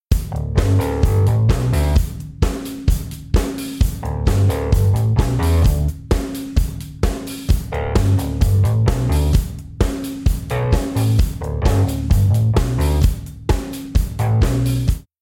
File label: Song1 Drms Bs Mixed